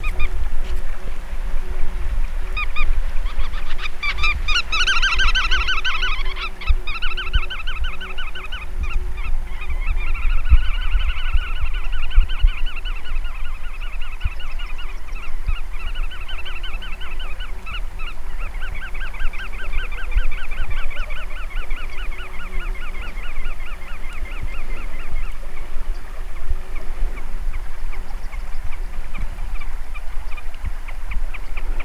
Bar-tailed Godwit

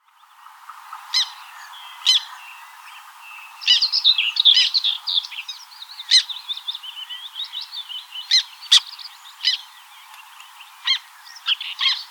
Black Tern

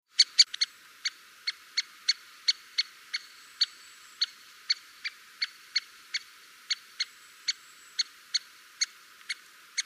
Lesser Spotted Woodpecker

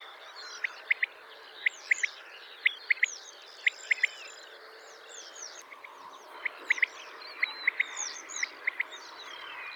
Quail

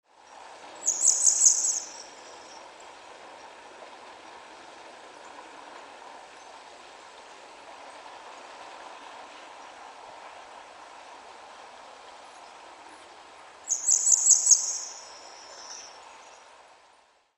Grey Wagtail

